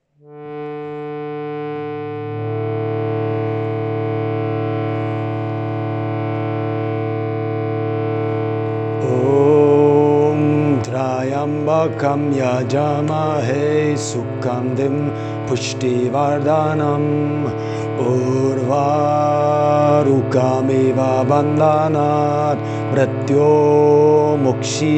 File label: Maha Mrtyunjaya Mantra